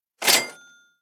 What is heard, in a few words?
Cash gun empty